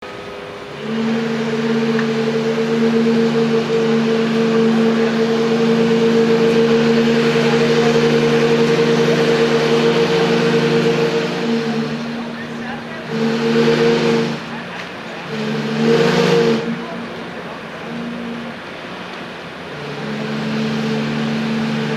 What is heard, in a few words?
mississippi queen steam whistle